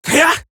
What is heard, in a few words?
pain, female; Warframe; Eximus Grineer Female; Battle Barks